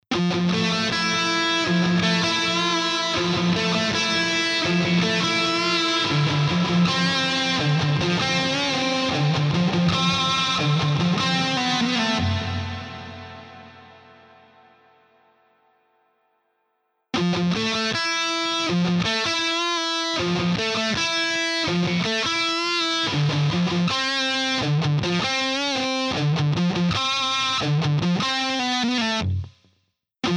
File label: SP2016, distorted electric